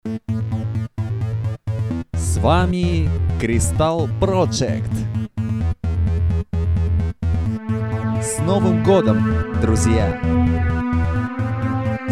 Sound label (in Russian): Новогодний Mix Коктейль Dance Beat Version